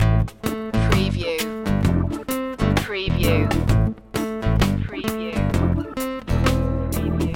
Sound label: sensual noon loop